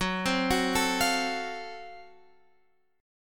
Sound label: F sharp Minor Major 7th Flat 5th F sharp down